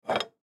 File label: Glass Plate Pick Up Scrape Wood Counter Top; Household; Dishware